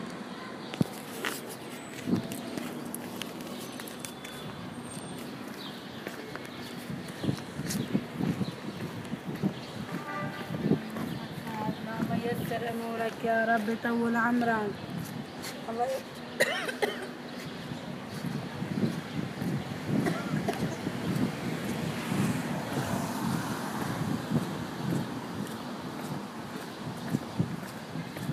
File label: hamra soundscape